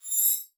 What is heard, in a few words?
Multimedia Internet CD-Rom Flash Animation Musical